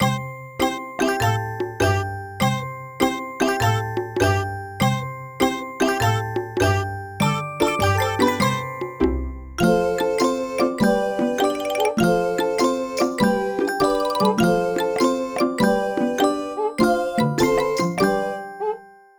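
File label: background music shop; ovenbreak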